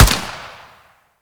veresk shoot, weapon; metrocraft

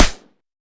veresk silencer, weapon; metrocraft

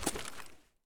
sand footstep; metrocraft

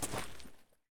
sand footstep; metrocraft

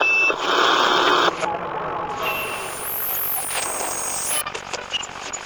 static, Radio; metrocraft; customnpcs

